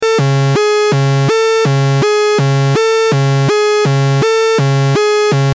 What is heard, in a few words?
8th accent before, synth